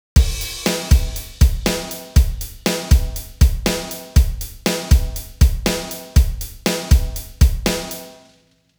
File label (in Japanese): シンコペーション; drum-yougo